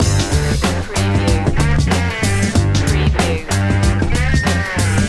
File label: beats loop